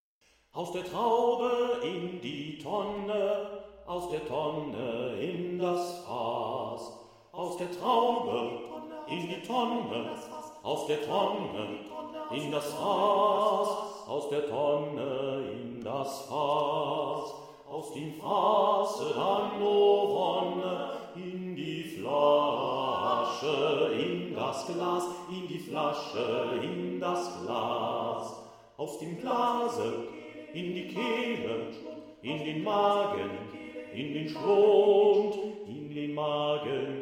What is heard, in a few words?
bass